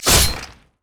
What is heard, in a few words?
basic attack claw; rengar